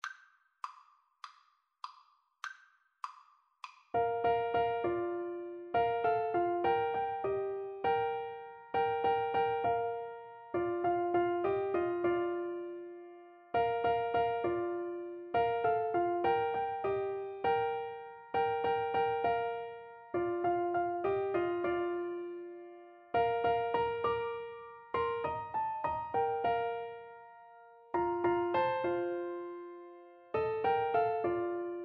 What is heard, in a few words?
piano duet; How Great Thou Art